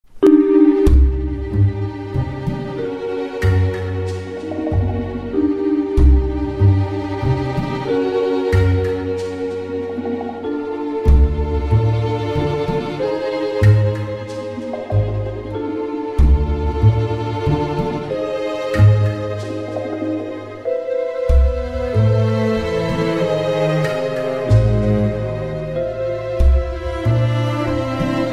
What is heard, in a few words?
Dungeon Upper Floor; UWO BGM